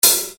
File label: Hat, EuroDance